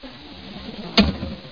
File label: Underground Sign Grass Close